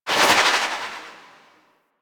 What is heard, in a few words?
Fairy Bullet Jump; DE; Warframe